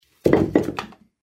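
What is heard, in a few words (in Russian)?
топор бросают на пол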